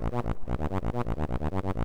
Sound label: chunky crunch bass; stompsynth